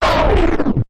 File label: Hollow Grit Sweep